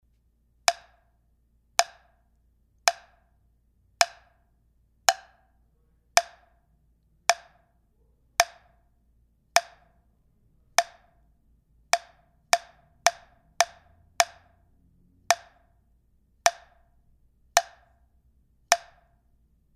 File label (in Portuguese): MATRACA SELVAGEM Entonação tímbrica